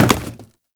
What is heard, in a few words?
Carboard Box Impact; Ambience; Gameplay; Warframe; Nineteen Ninety Nine